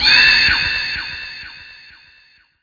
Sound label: Screech, Electronic